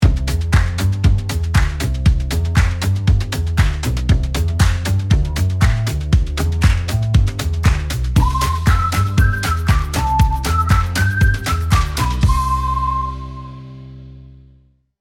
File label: music